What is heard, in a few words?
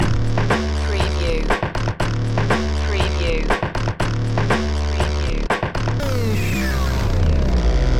breakdown